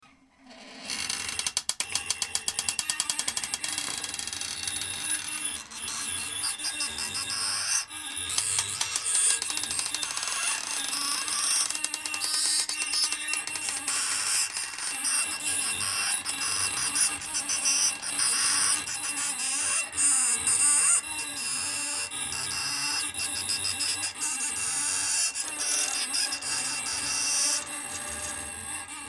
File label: LIBRARY MUSIC